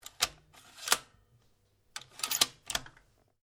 RIFLE REMMINGTON700 7.62MM BOLT ACTION CLOSE ROUND CHAMBERED; A selection of domesttic firearms; Rifles